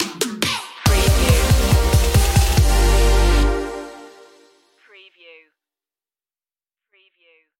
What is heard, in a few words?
oriental stinger